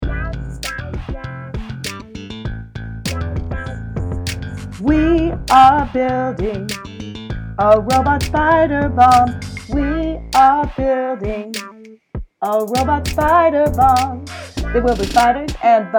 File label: Spider Bomb vocals